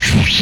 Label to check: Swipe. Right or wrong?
Right